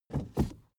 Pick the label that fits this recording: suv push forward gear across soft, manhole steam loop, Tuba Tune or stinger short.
suv push forward gear across soft